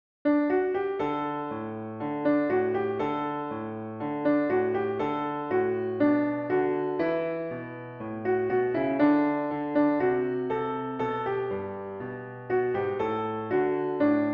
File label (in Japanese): ピアノ